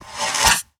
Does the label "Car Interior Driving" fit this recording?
No